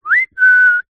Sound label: human whistle soft